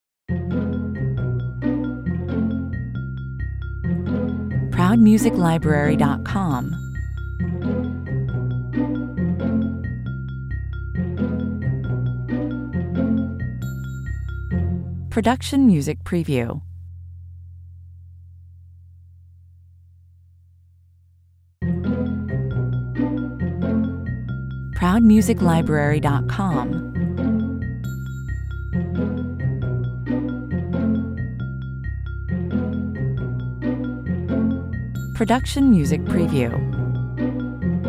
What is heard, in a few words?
no lead no drums perc